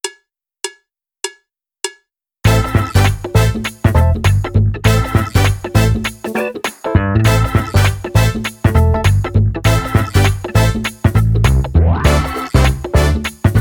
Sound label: Simple But Funky drumless